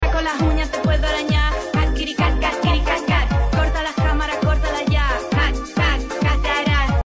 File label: lvl1 song